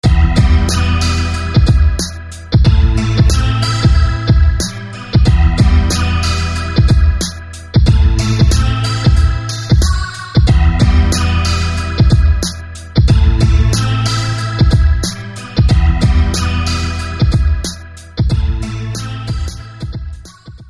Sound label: Guitar; Starmetal; SFB FX